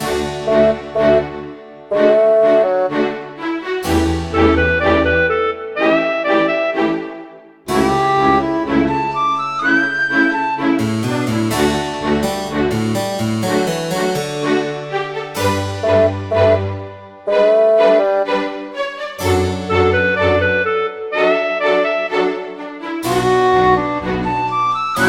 gag shop

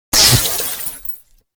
zap lightbulb impact

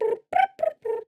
kiwi med

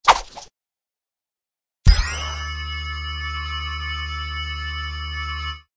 large magnet

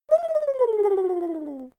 turkey howl

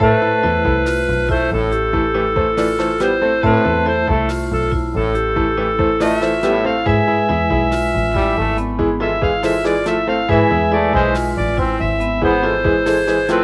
boss lobby

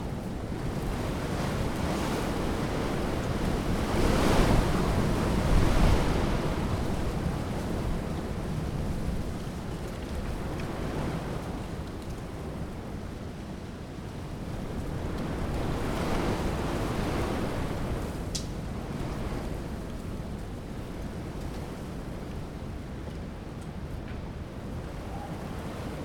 fog

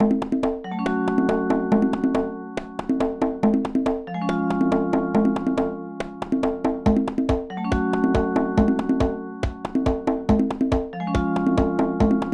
hard boss cutscene

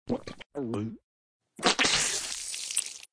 squirt glass water

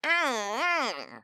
armadillo

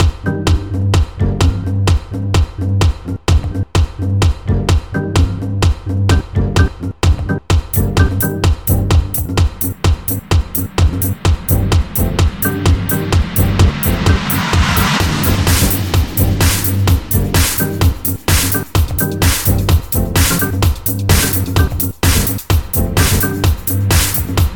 interior; Sellbot

